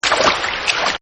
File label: Splash; Splashes; Objects; Partners In Rhyme